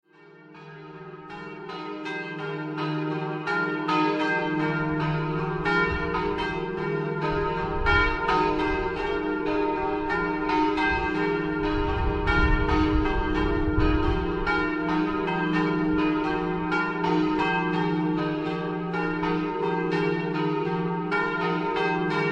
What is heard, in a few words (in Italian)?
TREPPO CARNICO, campane